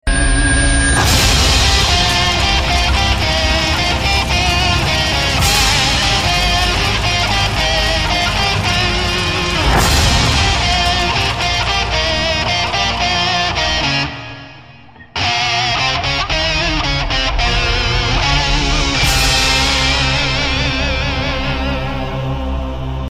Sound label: ringtone; dhoom 3